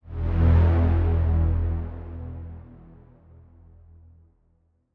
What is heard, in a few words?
Chime; chime sharp